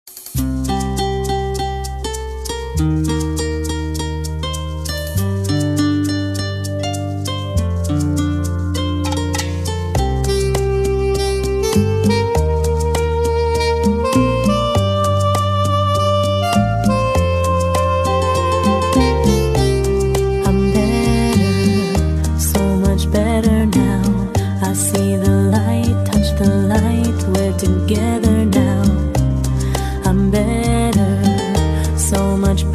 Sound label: Ballroom Rumba